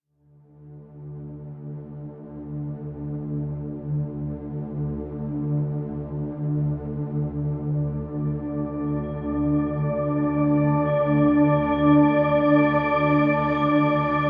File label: Hub Amb Cue, Music; Warframe; DE; Dynamic Music; Entrati